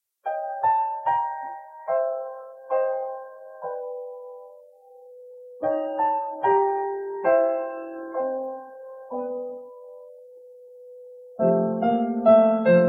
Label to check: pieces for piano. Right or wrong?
Right